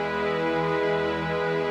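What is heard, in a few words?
Pad E major, Orchestral; Sonic Foundry (Sony Creative Software); Orchestral VOL-2; Pads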